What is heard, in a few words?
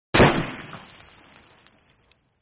Ax Impact Wood, axe hits